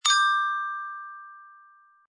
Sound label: glint